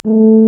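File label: brass, TUBA C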